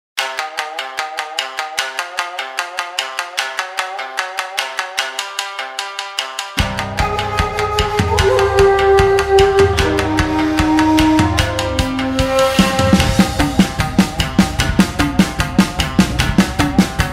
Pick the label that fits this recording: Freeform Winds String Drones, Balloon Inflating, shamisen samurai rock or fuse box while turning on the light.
shamisen samurai rock